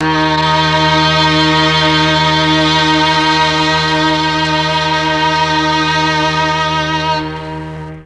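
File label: D#2 MIXED STRINGS, Mellotron; Mike Pinder - Mellotron Archive CD; MIXED STRGS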